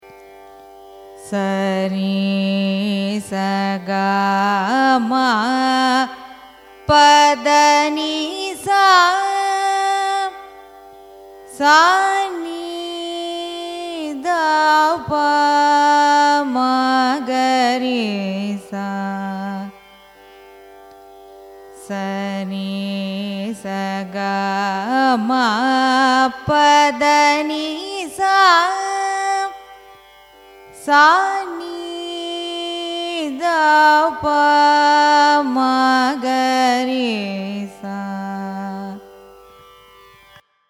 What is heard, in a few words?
raga ahiri arohanam avarohanam; carnatic music